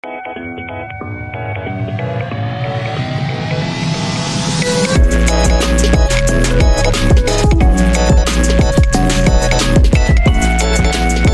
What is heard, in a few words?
remix iphone ringtone remix